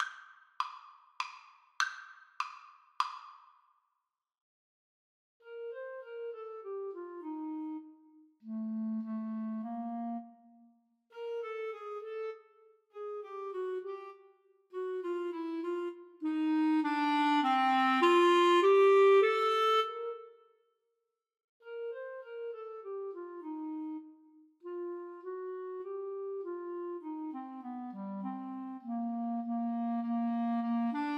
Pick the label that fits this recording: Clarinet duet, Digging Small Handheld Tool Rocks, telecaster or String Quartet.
Clarinet duet